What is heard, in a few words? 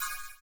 CYM, Ethno Techno; ILIO - Ethno Techno